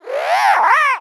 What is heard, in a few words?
Critter, Animal WAVs